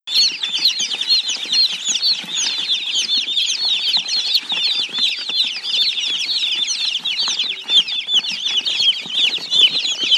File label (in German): Kueken